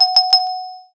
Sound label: INPUT